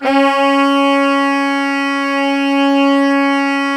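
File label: TENOR SAX, Sax section; Giga Samples Collection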